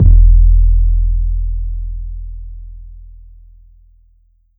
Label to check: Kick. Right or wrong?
Right